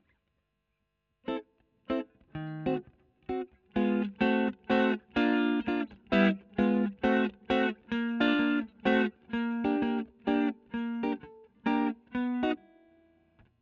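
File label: Electric guitar Royer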